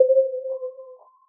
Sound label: BELL (C), Pedal One Shots; Build; Cymatics; ANALOG EVOLUTION Beta